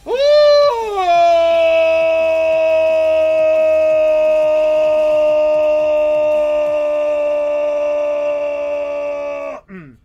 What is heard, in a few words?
scream